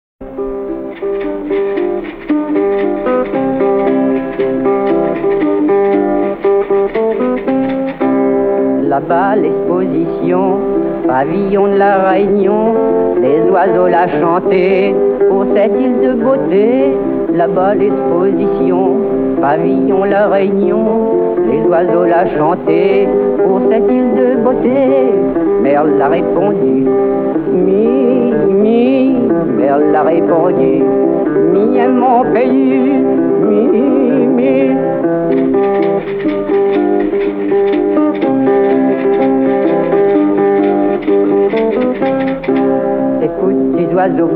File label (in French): Chanson zoiseaux